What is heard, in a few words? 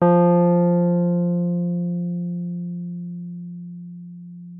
WURLITZER, F3; Xsample VOL-1; 90 s Sample CDs; WURLITZER RS